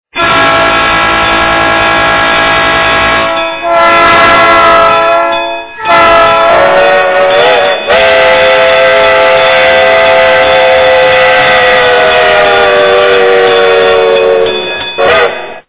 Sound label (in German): Pfeifen